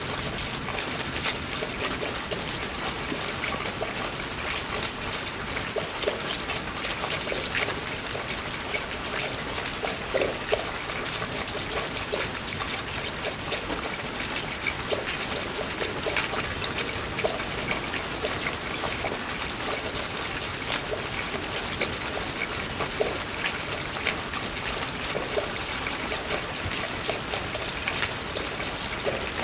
Rain

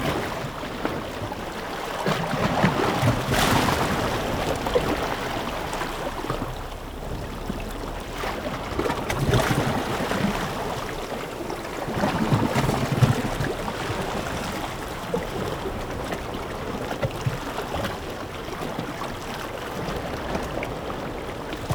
Ocean Water Between Rocks; Sample Rain Library; Nature Sounds Collection; Splash

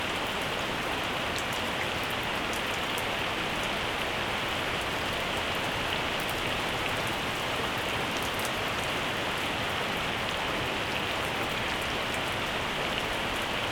Night Rain Peaceful Sleeping Sounds; Dallas

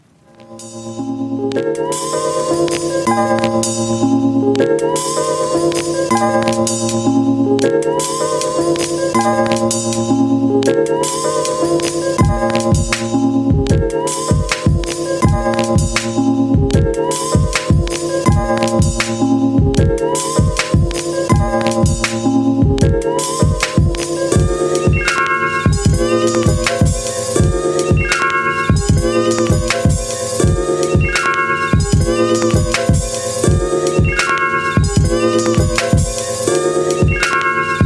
Beat

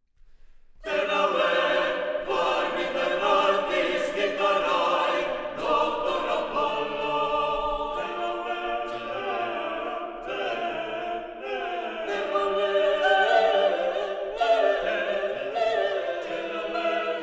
Solo Voices and Mixed Choir a capell; Exercitia mythologica

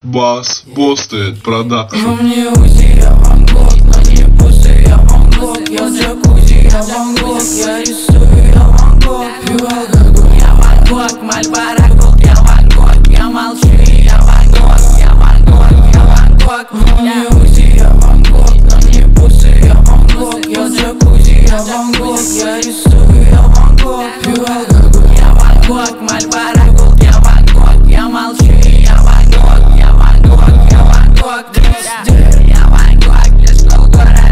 bass boosted

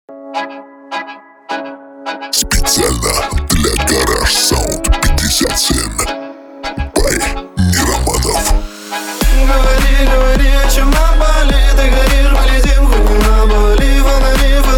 37-41Hz Low Bass

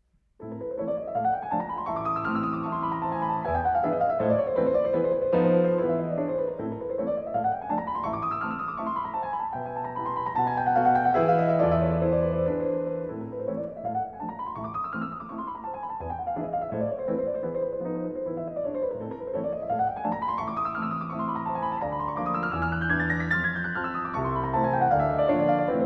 Etude in A Minor Chromatic; Chopin; Etude No.2 in A Minor Op.10

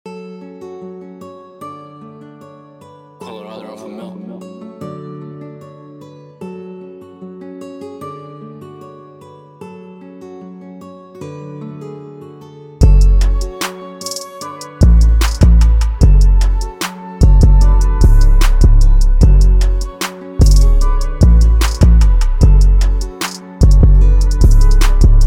Sad ocean 150 bpm

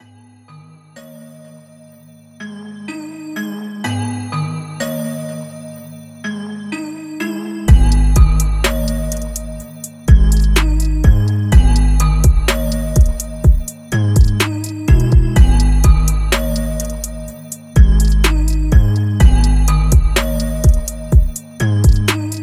Instrumental Hip Hop Beats

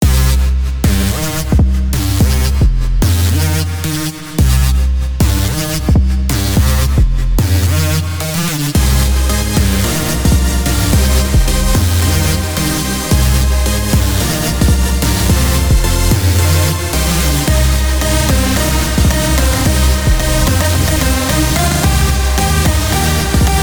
Bass Drop

